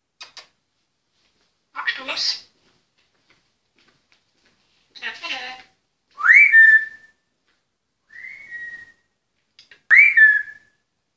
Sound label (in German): nuss pfeif konzert